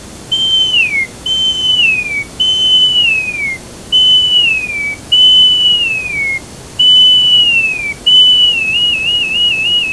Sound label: WHISTLE, dolphin